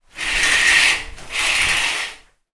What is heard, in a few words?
household shower curtains pull x2